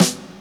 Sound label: SD, HIP, Dance Mega Drums